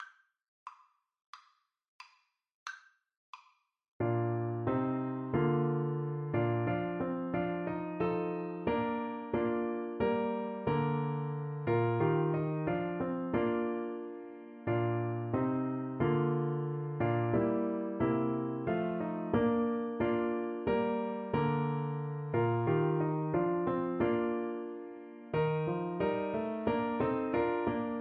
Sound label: once in royal, Tuba